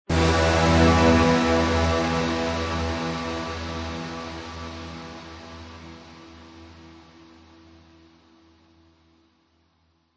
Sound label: sound design cinematic stab orchestral hit dramatic sudden